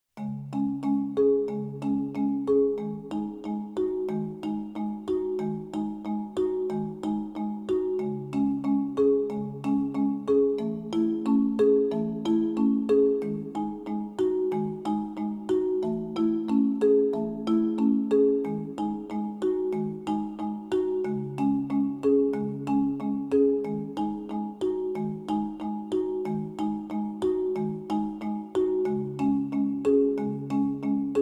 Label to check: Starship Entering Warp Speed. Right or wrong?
Wrong